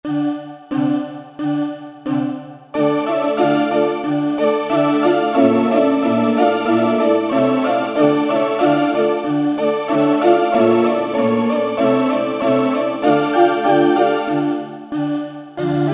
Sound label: Atm Koto Brth, E-mu Morpheus; Factory Presets